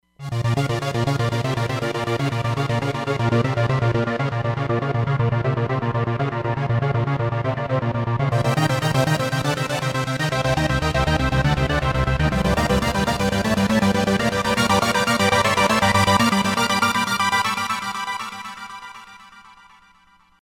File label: Step Pit Shift